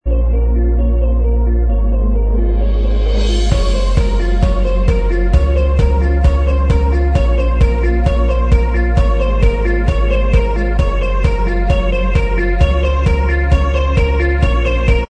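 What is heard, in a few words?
Inst Track, instrumental track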